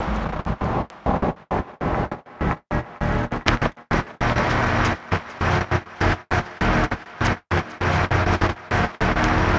Envelope Beat; Drums & Drones

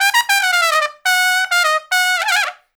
Trumpet, Jazz Traditional; Sonic Foundry (Sony Creative Software); Jazz Solos and Sections

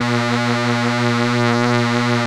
3100 SAW A#3, Korg PS3100; Back In Time Records; Korg Universe VOL-1

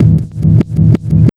Big Beat Drumloops, 138 FX; USB Soundscan vol.25; AKAI; MOVE 138

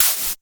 NOIZ MOD, Dual-Percussion-Synthesiser; Klone; KLONE FX; Drum Machines

